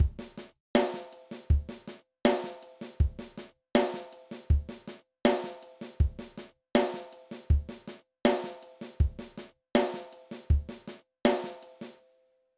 Ghost Notes Exercise, drum

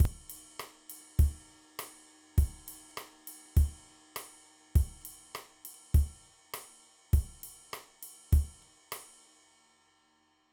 Mambo Drum Beat

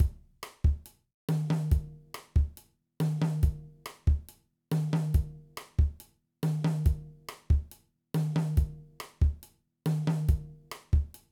Afro Cuban

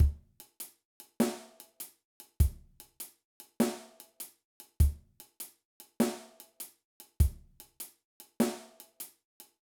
Shuffle Groove, drum

